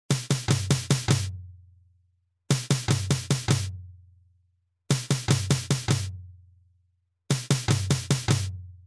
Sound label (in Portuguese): Toque - Surdo Medio